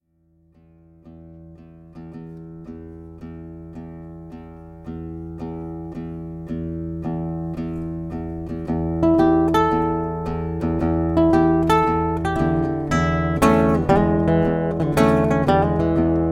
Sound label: lonesome couch blues